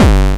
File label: bombo, kicks, Hardcore